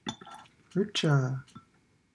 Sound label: Snare